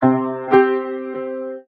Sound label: intro sting